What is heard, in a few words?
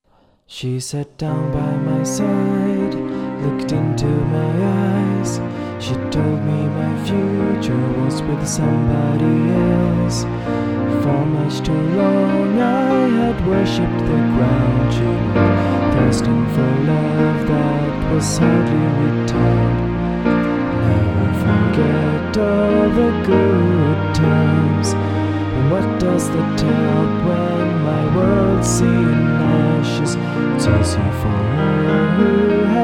Ballad